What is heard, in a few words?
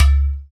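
percussion udu; Roland LCDP14 Africa VOL-2; Afro Menu 1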